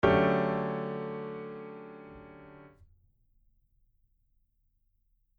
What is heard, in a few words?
dmaj7 g